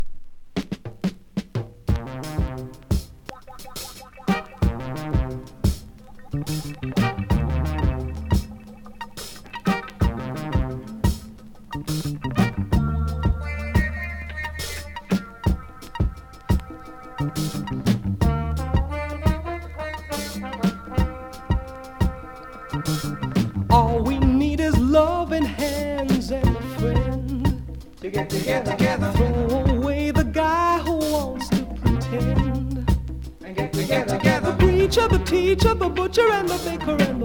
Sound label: Jamaica Funk Breaks Soul; AQUARIUS